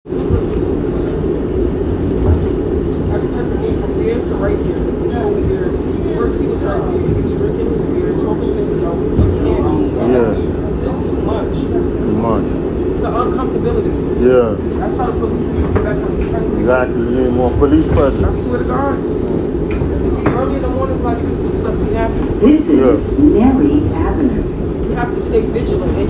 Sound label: Train Recording